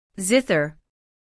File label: zither